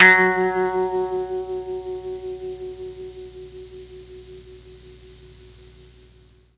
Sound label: F#2 GUITAR, Mellotron MKII; Mike Pinder - Mellotron Archive CD